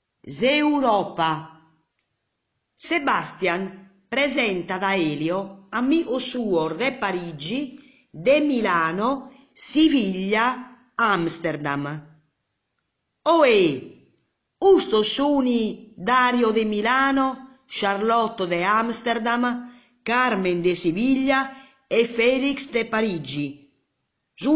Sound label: Sardinian Orgosolo; Giga Sardinian